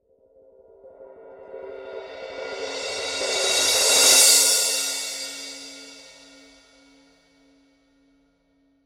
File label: Paiste 2002 16 thin crash swell